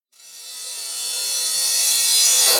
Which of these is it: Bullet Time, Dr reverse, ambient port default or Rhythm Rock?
Dr reverse